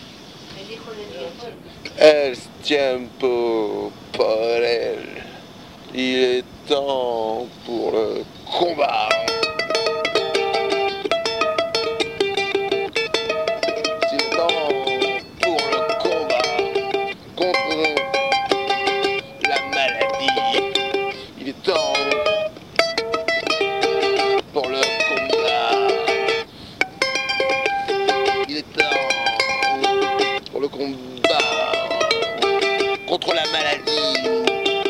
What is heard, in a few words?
charango